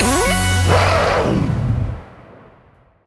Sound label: Symbol Panther